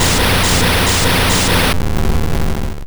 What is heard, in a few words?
Blizzard Direct; Pokemon; Gen 1; attack moves; RBY